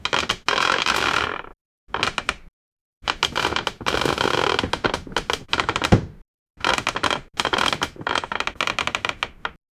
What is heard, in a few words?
wooden seat clatter theat; theater